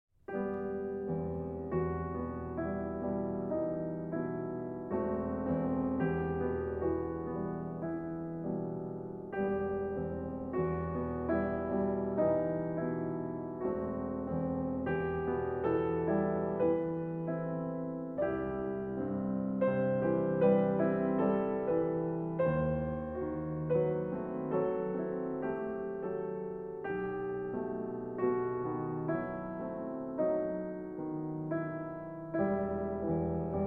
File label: piano sonata